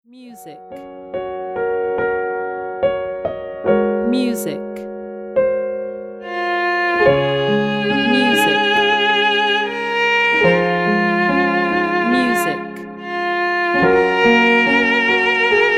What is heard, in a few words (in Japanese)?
動画・アニメ用BGM